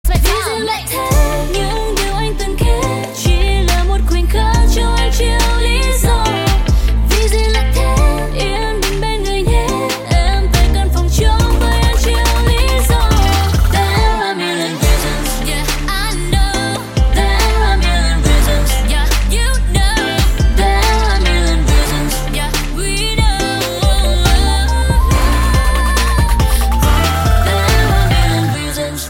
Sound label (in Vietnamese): nhac chuong